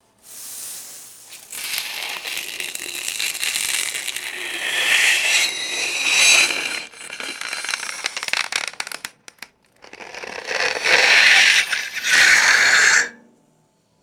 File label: abrasive metallic dry ice scrape